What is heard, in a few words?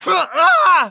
Damage Fly; Smash WiiU; Kamui